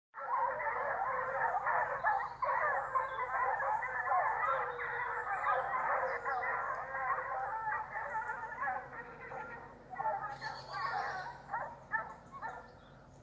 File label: Hounds in cry; foxhunting